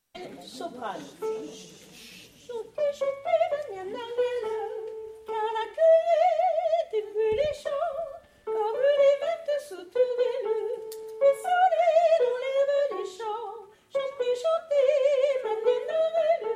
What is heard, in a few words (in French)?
soprani; Les Magnanarelles - Opéra Mireille de C. Gounod; Magannarelles soprani